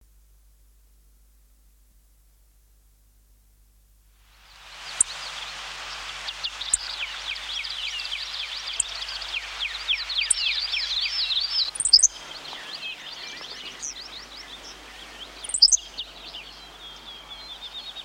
henslows sparrow, songbirds

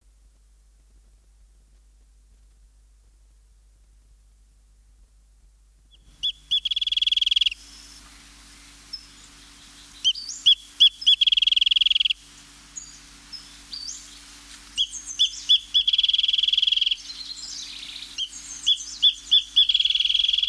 wrentit, songbirds

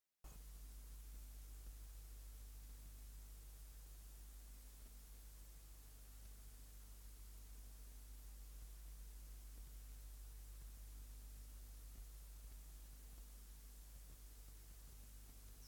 eastern kingbird, songbirds

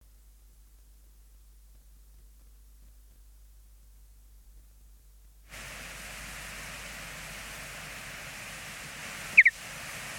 mountain bluebird, songbirds

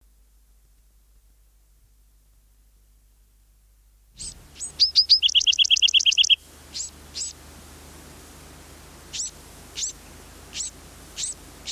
bewicks wren, songbirds

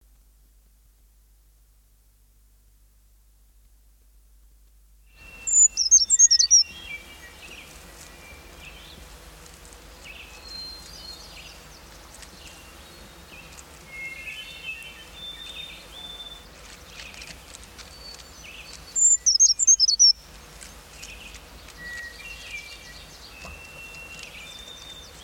brown creeper, songbirds

